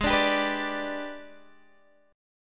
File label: Minor Major 7th Flat 5th, Guitar, DADGAD; Gsharp; x,11,12,12,14,12